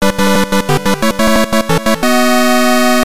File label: Win Bonus Stage